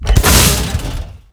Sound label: fire; garrysmod